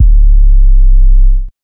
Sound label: Big, classic 808 drum kit; puredata